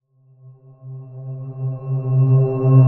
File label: Riser Metal Synth C; Ghosthack